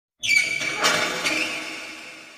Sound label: move duct; ennard